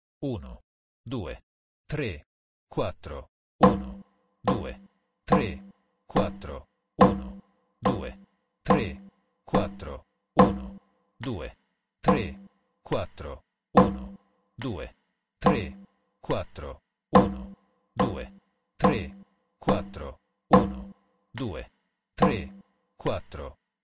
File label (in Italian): esercizio Quaternario; Sonora vol A